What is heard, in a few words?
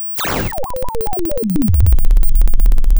pop